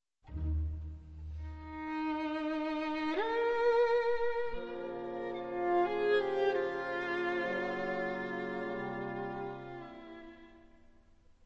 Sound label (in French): violon Lent; Concertante